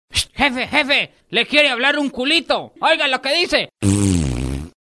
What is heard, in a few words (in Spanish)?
pedo gracioso castellano tono de llamada